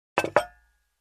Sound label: Placing dish on table